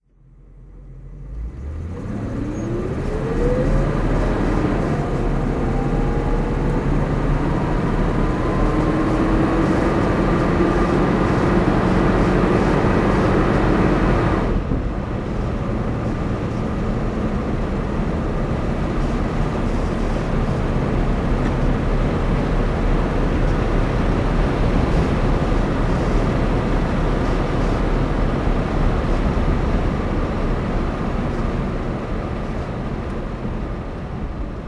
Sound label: cadillac deville driving; Cadillac Sedan de Ville, 1959; Engine sound driving; V3D/ ClassicarImages; Marc Vorgers